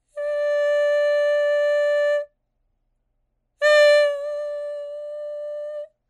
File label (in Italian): VOX Sforzando